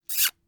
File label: kiss